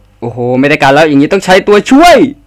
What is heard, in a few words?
rage; ff2